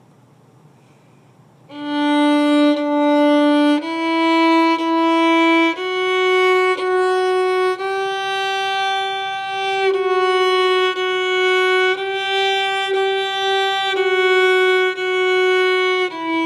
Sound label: Marching Song